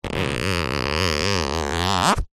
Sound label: plastic rub squeak with hand; foley